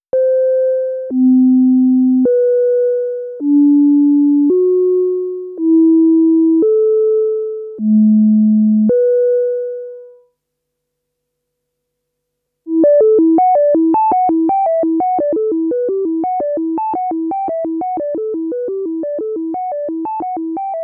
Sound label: Resonance only dry